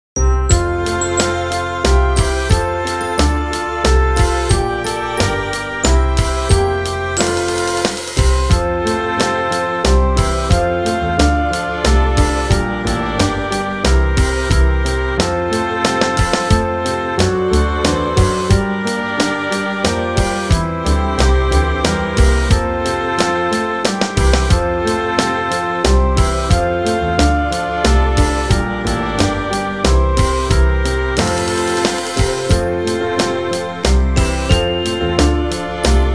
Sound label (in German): O Tannenbaum Playback